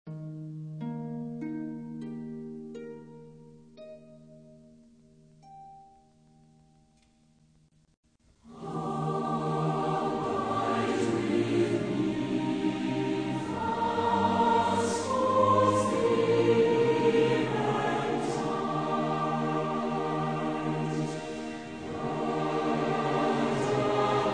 Orchestra and Choir, Hymns

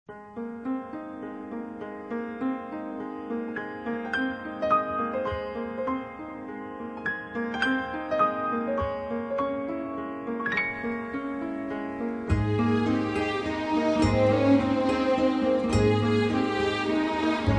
Orchestra, Strings; TM & DM MINISTRIES

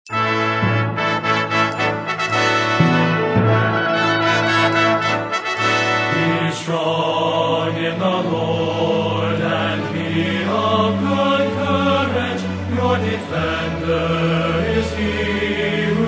Singers And Orchestra

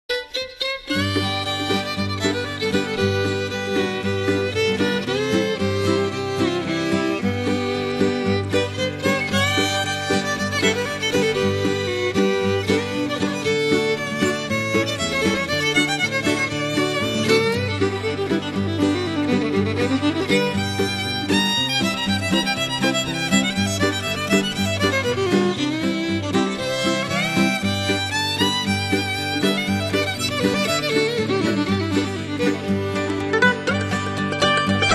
old time gospel instrumentals

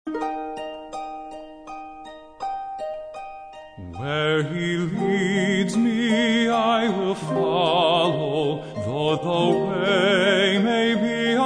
Singers And Orchestra

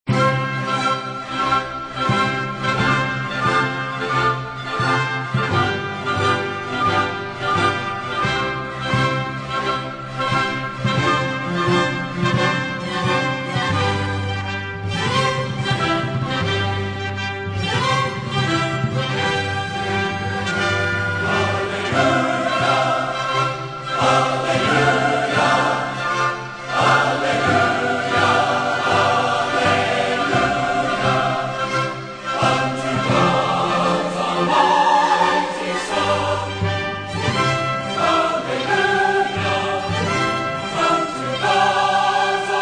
Choir And Orchestra

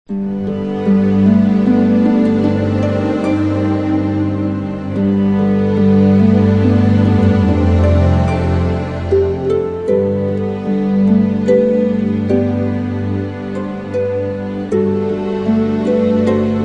Orchestra

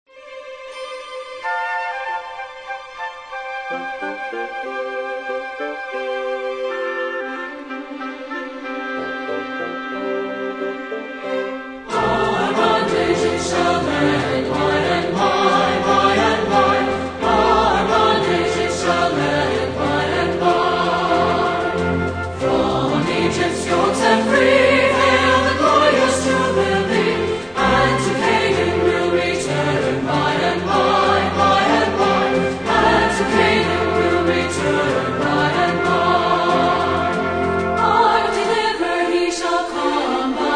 Singers And Orchestra

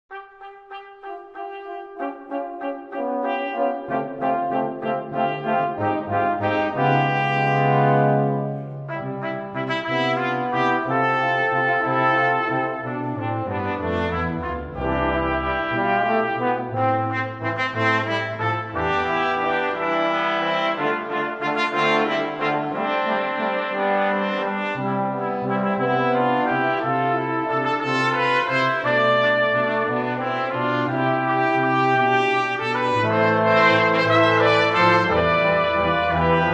brass ensemble

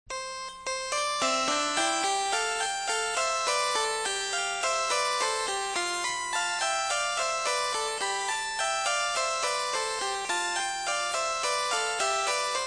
Orchestra, Strings

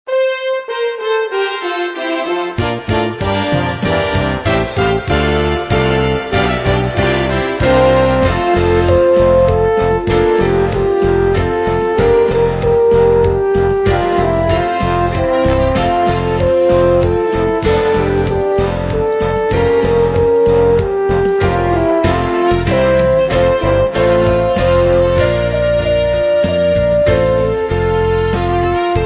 Instrumental Hymns

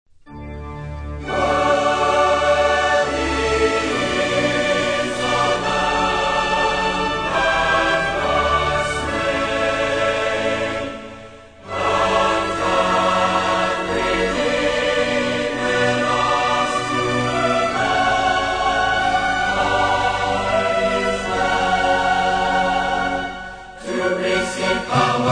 Choir And Orchestra

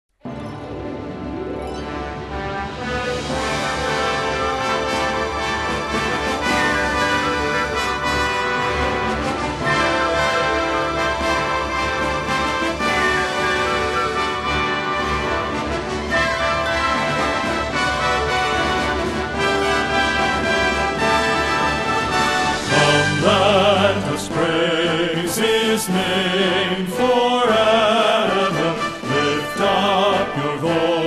men's chorus

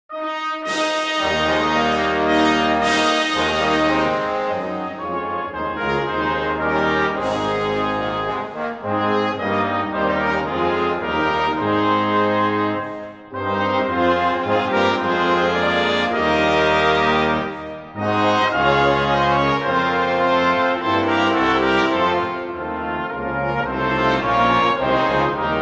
brass ensemble

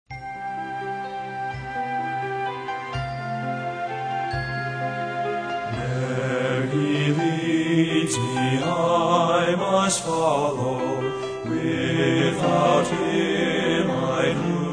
Trio, Like a Lamb I Will Follow

